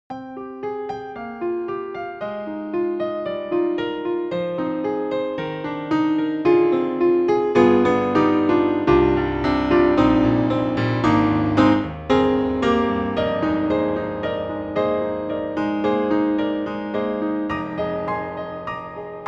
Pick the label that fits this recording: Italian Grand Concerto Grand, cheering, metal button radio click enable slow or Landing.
Italian Grand Concerto Grand